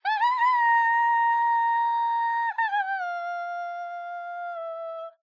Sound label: Kulning; bramble the mountain king; ps4 ps5 switch windows xbox one xbox series xs